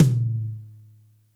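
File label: TOM soft; Zero-G; Chemical Beats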